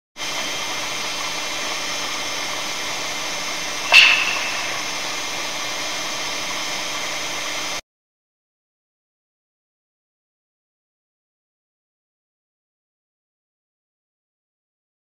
Clap Sounds of Northern Lights Sound Source 70m Above Ground Level